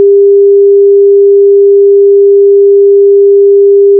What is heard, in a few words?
sine 400Hz; ①sine 400Hz 4s.wav; KSP-440; KSP-400 次期モデル・オープン開発; YouTubeの動画 技術解説シリーズ＃138で使用したWavファイルです。